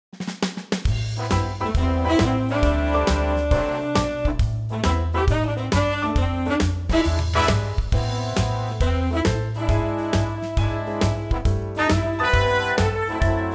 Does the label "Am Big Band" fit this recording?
Yes